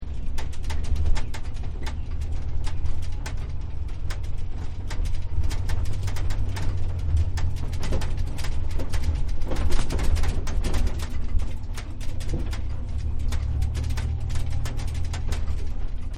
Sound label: Bumby Ride, bus; Busses; Vehicles; Partners In Rhyme